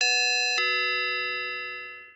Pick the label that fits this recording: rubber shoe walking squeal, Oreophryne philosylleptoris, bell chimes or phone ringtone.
phone ringtone